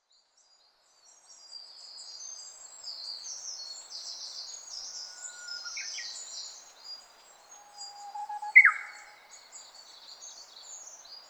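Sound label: Japanese bush warbler; uguisu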